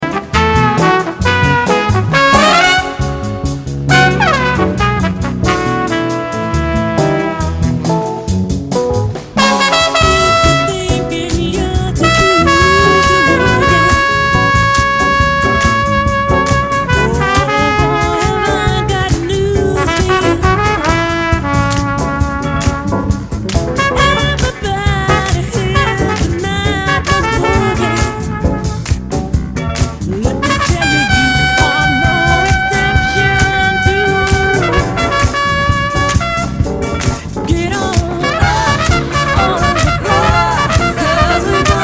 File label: boogie